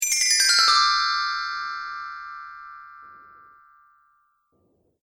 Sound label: Sparkle Chimes Down; Chime Sound Effects